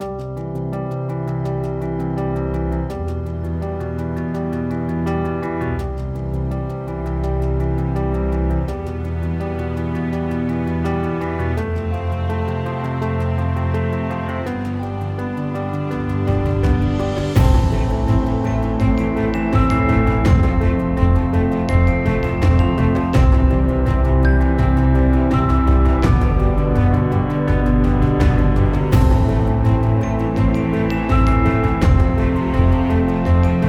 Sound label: Hopeful Piano